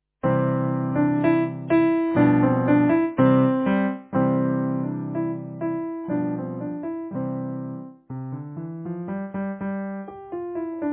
piano

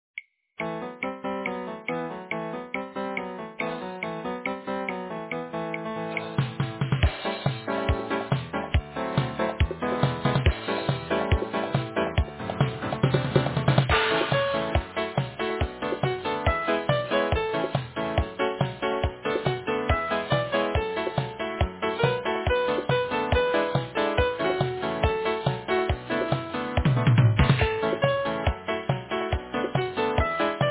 instrumental

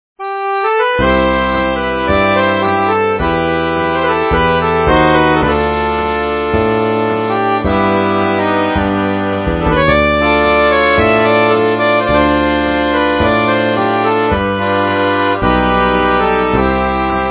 french cafe

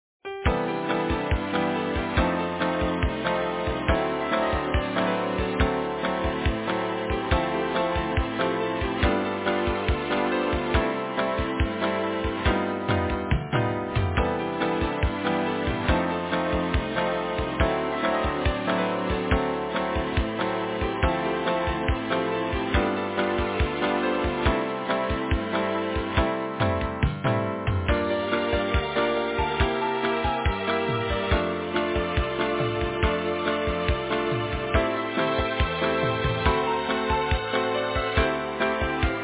samba instrumental

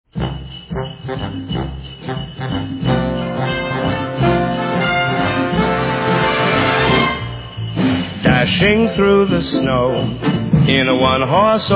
Jingle Bells

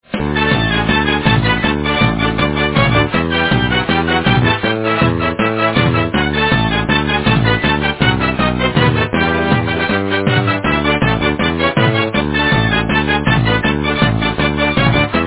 carnaval instrumental

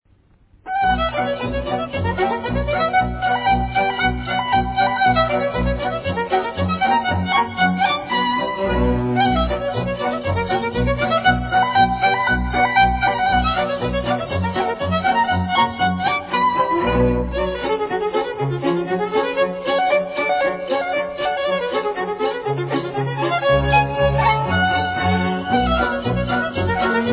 Polka

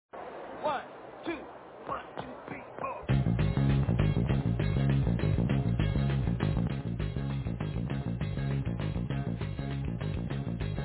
concert intro

